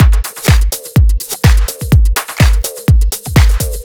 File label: Drum Loops, 125 BPM, Underground UK House & Electro; Sonic Foundry (Sony Creative Software)